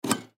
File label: Jigsaw Unplug; Industry; Saws; Machines; Blastwave